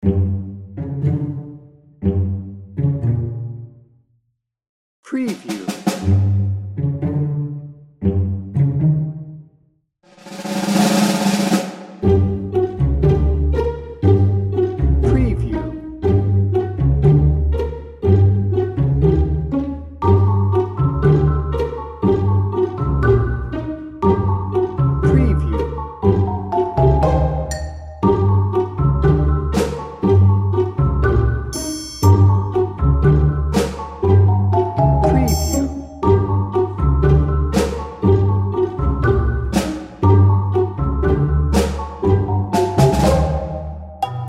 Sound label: cartoon adventures no horns